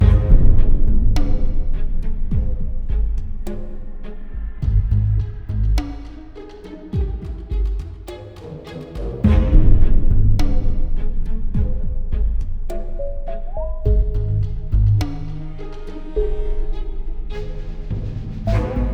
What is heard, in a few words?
Ghost Neutral